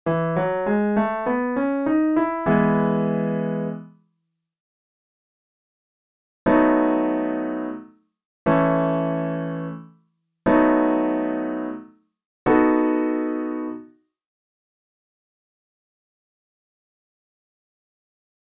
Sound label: I, IV, V7, progressions